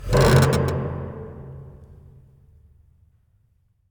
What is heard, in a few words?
Gate Open; backrooms 2d android ios online windows gamerip